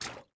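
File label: water run right, footsteps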